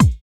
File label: BD; E-Lab; X Static Goldmine 1